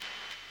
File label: static; Babyface; competition2020; ifarchive